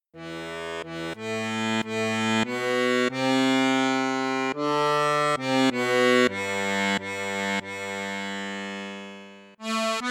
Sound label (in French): registre flute juste flute haute, accordeon; cavagnolo orchestre v